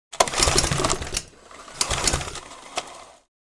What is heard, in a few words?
Electric grass trimmer start fail